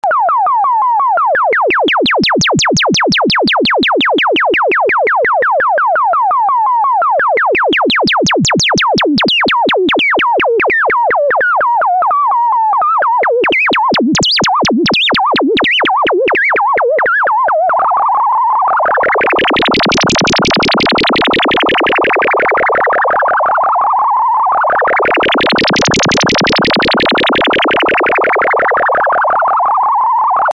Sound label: saw LFO